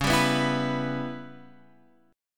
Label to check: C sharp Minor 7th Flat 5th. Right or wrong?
Right